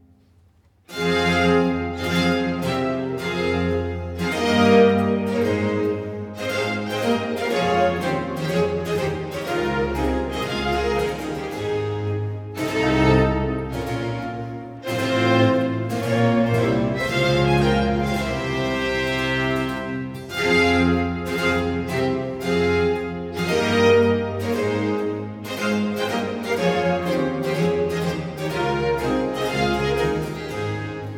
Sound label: Sonata a Quattro 2. Allegro; Arcangelo Corelli; Corelli - Complete Works; Klassika